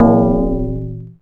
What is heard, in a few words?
A#, Synclav FM PERCUSSION; Big Fish Audio